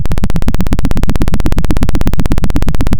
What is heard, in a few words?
pop, Sound of a helicopter